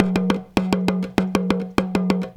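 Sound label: small tama groove; Best Service; World Colours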